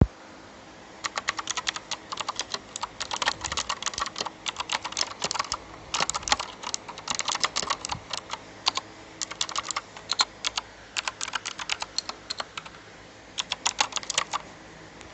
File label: gateron blue switches audio recording